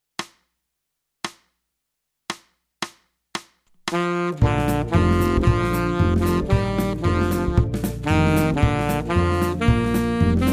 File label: Yellow rose of Texas Alto